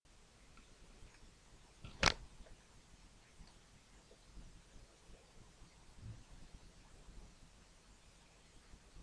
hammer

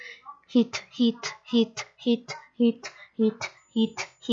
hit

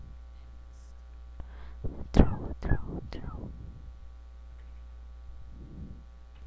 throw

